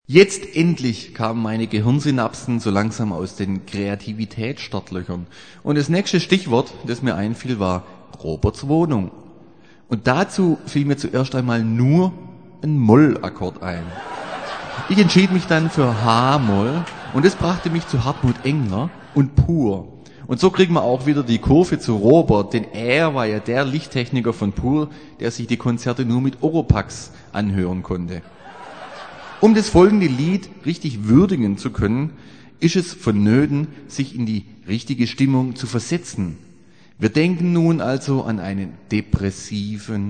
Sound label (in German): Moll Akkord